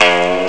old guitar; Amiga Soundtracker Sample Packs

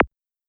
Snap, Visco Space drums

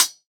Closed Hat, JUNGLE BREAKS

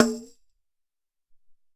Tabl Tamb

